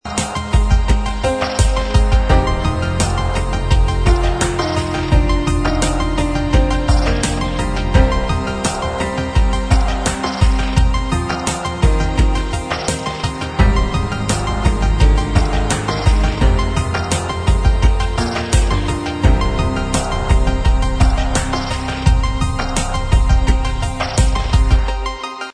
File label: electro pop